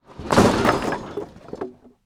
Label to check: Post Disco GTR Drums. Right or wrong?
Wrong